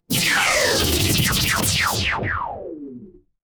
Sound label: magic scifi laser